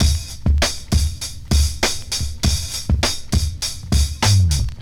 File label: 100 bpm hq drum groove g key, Free breakbeat sample - kick tuned to the G note. Loudest frequency: 2306Hz